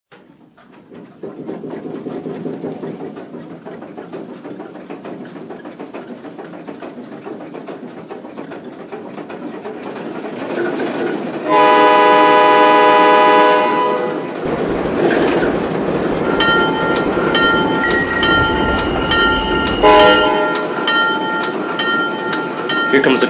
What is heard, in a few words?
Alco S-2, trains